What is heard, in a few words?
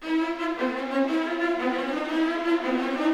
GRO, Orchestral Loops; 128 HITCHCOCK